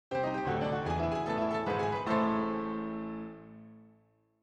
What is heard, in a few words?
Quick Piano End